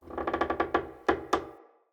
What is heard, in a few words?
Dendrit Structure Wood Creak Small, Ambience, Fort